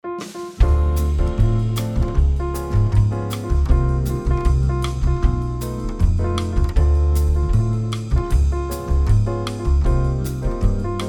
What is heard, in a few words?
jazz vintage; surReal